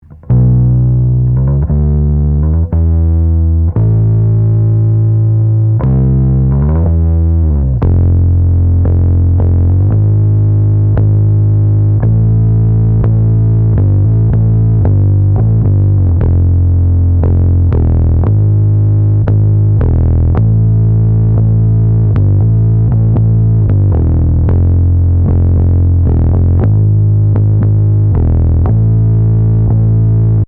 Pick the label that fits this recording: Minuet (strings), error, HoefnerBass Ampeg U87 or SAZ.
HoefnerBass Ampeg U87